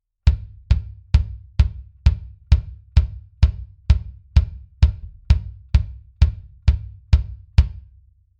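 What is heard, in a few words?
bass drum Mic Test RE27ND Mix wet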